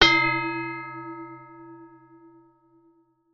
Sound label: Classic Ring; PomoLuxe